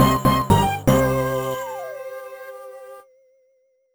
Game Over; Magical Witch Ashley

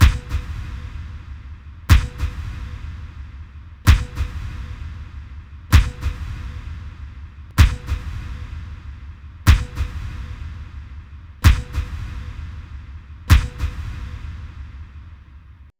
Ditty

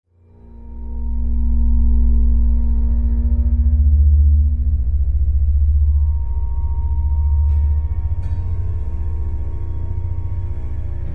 Eerie; the walking dead season one

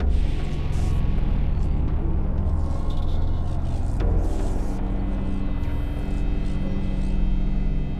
Level Lose

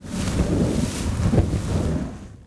Scrolling Fire

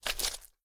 Cookies Butter Scoop

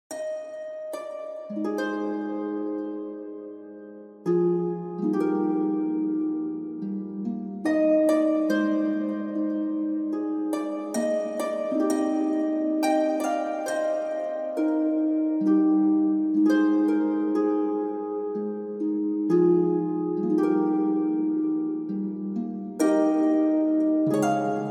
Harp C

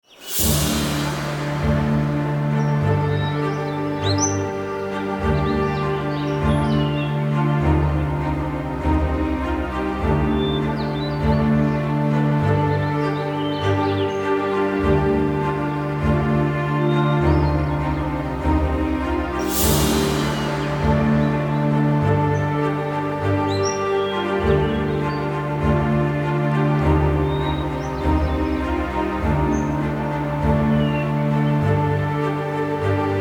Seaside Town Approaching Peace Tense; Serious Sam 2; Ellenier

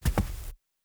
walk carpet

